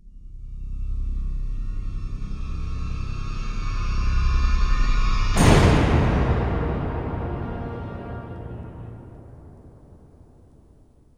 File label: Eerie stinger